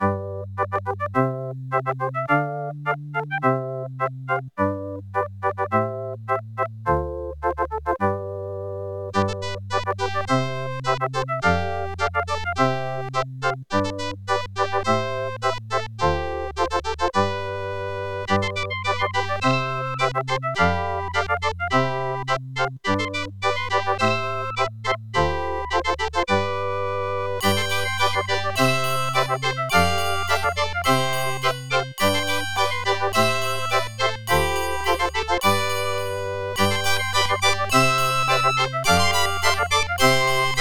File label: OPL3; Tetris